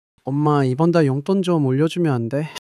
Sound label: struggle